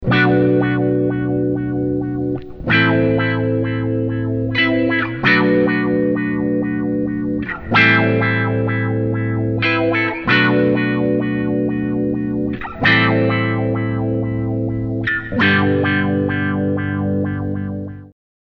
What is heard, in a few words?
Vision Wah